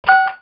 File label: phone dial